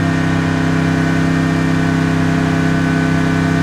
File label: Truck Expedition Loop; Trucks